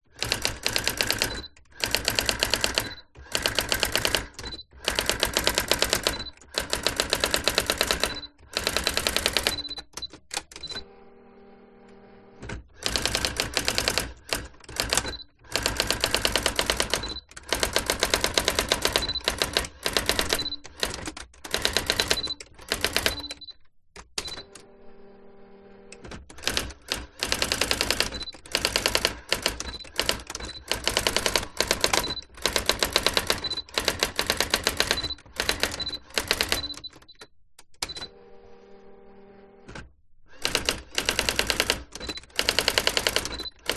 electronic typewriter typing fast sequence brother ax 450; office